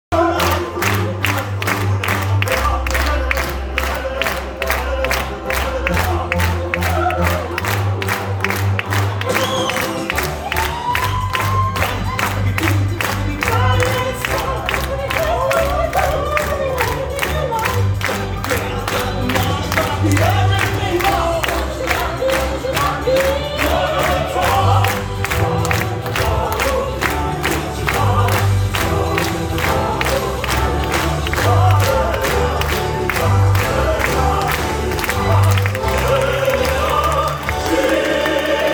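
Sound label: theatre music applause ovation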